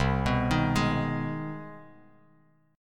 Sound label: C Minor Major 7th Sharp 5th, Bass Standard; CmM7#5 arpeggiated; JGuitar